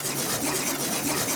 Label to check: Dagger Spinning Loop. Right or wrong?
Right